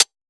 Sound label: Close Hi Hat, Dubstep Cymbals; One-Shots; Drums; Lenticular; Ghosthack; Ghosthack - AC2024 - Day 13 - Dubstep Sounds 2025